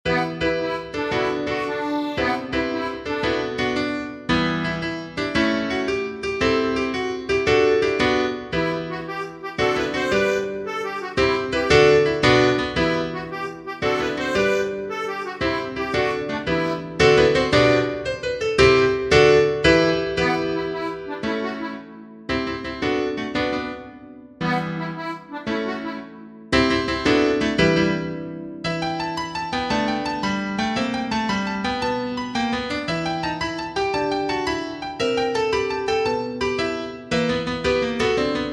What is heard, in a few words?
rondeau descendant